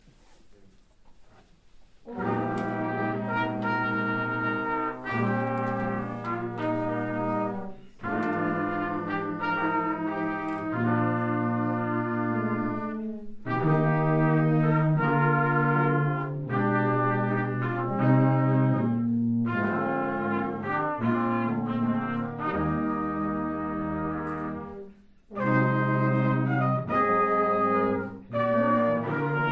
year 7 brass

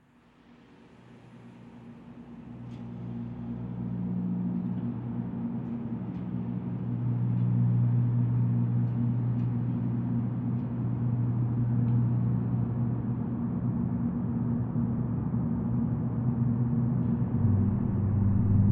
study for siren in g minor

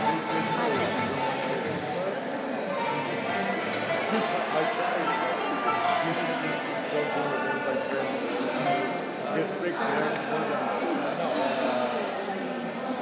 nyckelharpa

